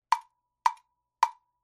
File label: Woodblock